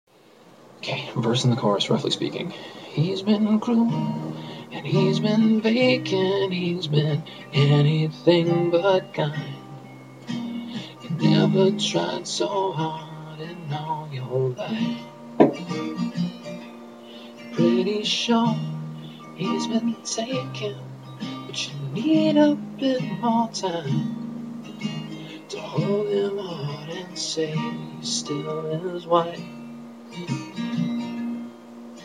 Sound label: chorus verse